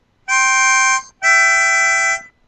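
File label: Fill, harmonica; reggae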